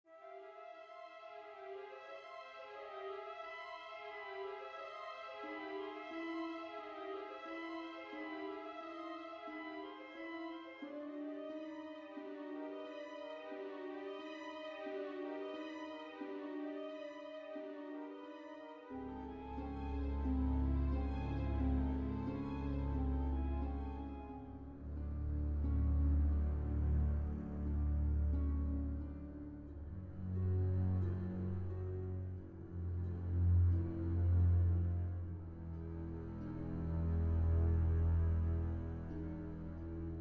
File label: Rising wind; horror